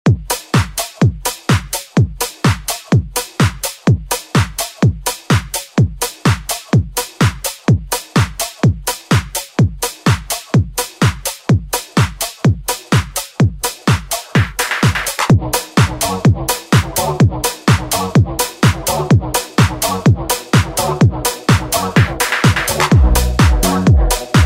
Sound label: Tech House; Deejay Hit